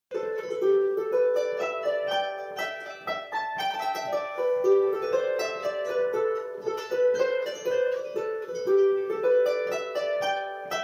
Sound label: polka; Ballydesmond